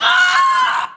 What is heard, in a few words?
Piercing scream woman